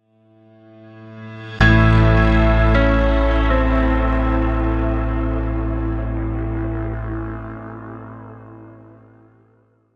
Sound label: Strange Ritual Sting